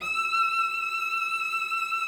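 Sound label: E6, cello vibrato; Xsample VOL-7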